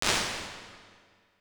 Green Chamber, Impulse Responses, Medium Spaces, Rooms; Apple